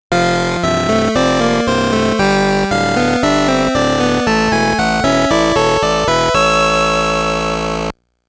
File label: RETURN IN TRIUMPH (STAGE CLEAR); R-Type Original Sound Box